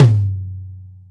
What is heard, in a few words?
drum hit whistle